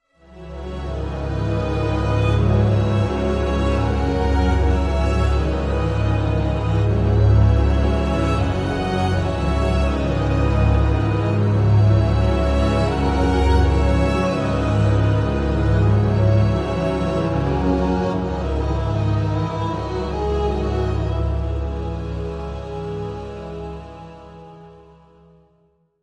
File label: Only Strings